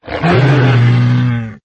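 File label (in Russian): стон животного резкий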